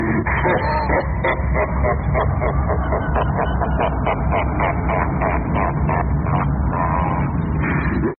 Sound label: darkness laughing